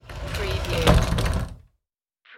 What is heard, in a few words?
shower sliding door